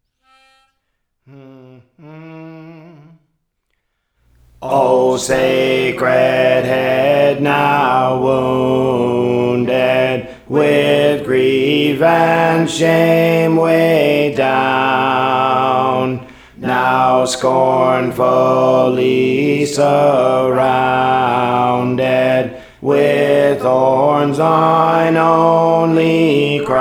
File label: Alto, Songs; Praise For The Lord Training